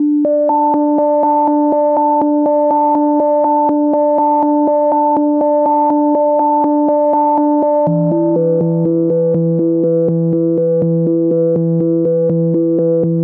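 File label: 122 BPM Melodic Arps A major G minor Tonic G; mmb studio melodic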